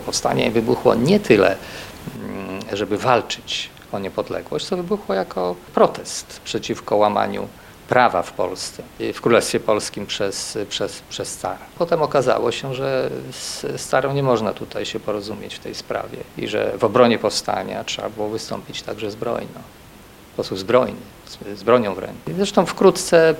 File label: pad